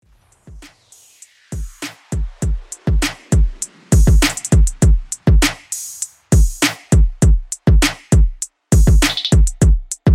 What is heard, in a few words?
70s Christmas Redrum 100 Bpm; 2020 Mix